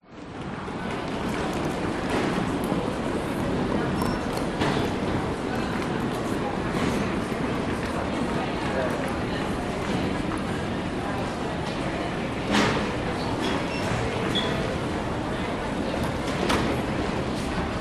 public places aiport terminal check in area busy people domestic; sydney